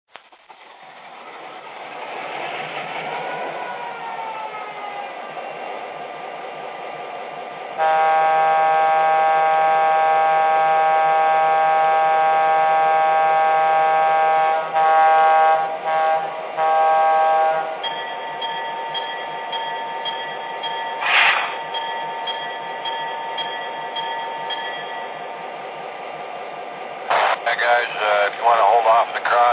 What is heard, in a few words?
SD24